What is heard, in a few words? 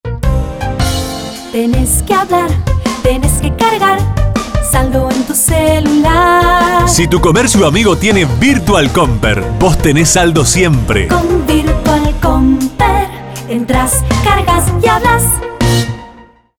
jingle